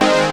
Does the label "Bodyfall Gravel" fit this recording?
No